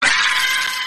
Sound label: pig scream; angry birds pigs out